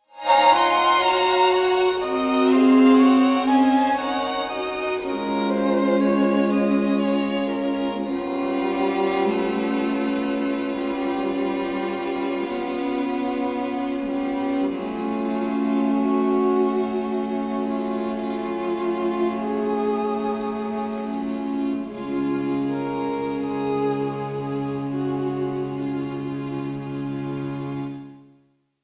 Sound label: string quartet in g minor; claude debussy